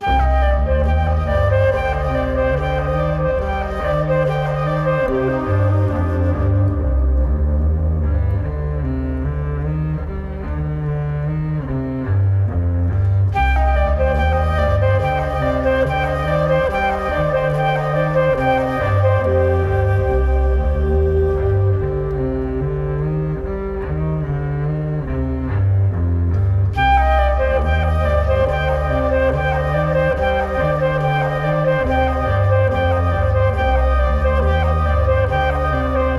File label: Echo Flute & Bowed Bass